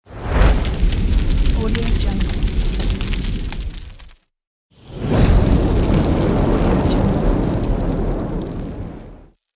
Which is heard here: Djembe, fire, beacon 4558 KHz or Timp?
fire